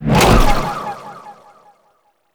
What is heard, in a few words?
Cloud Jump End; Warframe; Monkey King